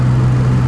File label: diesel engine